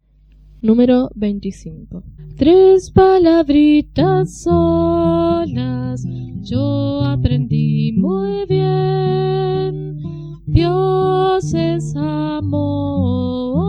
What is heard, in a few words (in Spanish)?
Coro, himnario niños